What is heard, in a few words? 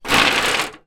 foley metal rod scrape on metal step ladder; zapsplat